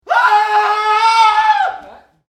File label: Scream Ringtone